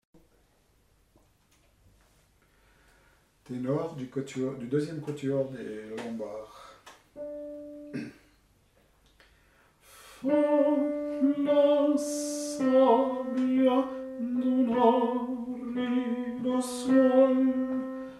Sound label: tenor